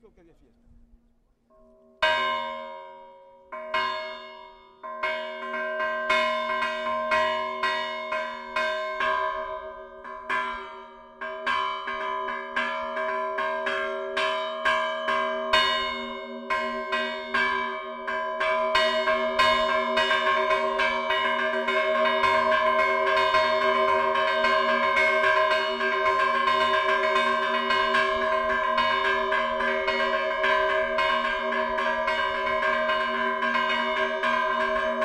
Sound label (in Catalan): campana